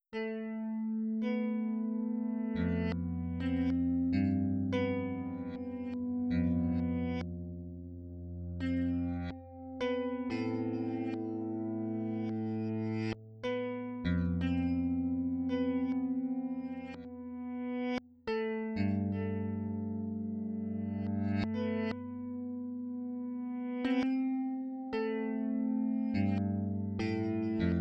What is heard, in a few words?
guitar Strat; SterRev